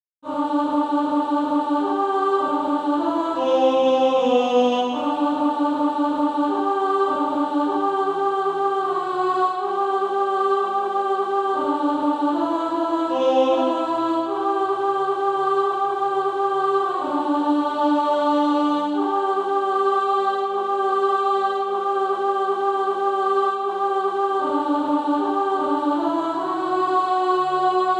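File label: alto, Practise Music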